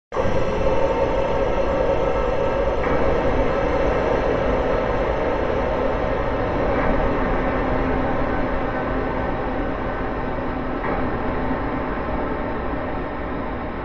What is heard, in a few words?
sound design cinemtaic hit large airy dark ominous danger